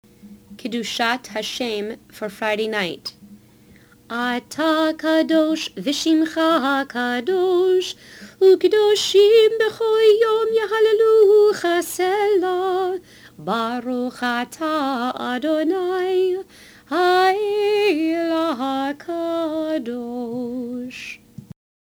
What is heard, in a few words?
Cantor Kedushat HaShem